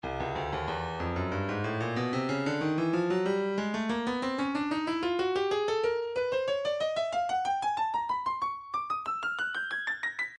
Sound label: breakbeat midi, 93 bpm; R&bees B; Stylus Breakbeat Midi Files